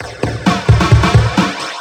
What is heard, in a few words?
drum loops; bb drum loops